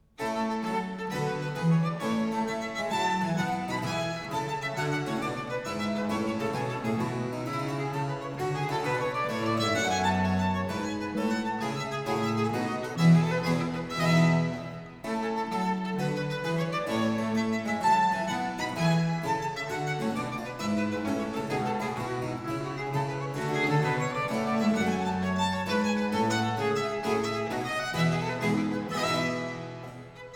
Sonata and Suite, for violin, viola da gamba & continuo in B flat Gig; Gigue; German Chamber Music before Bach